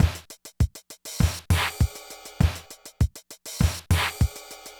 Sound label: Loop, drumtraks